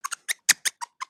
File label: Pets Skunk Happy; Lego; build mode